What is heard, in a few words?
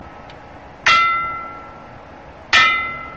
wheel tap